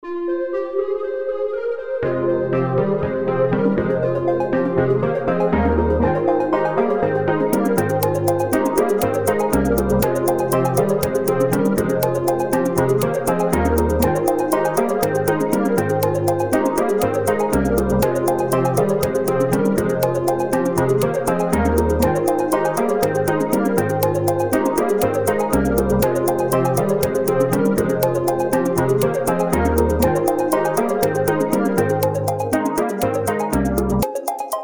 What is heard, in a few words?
melody ring tone